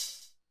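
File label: open hi-hat, mxr; classic machines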